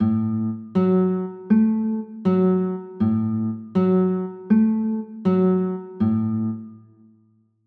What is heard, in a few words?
d maj, chords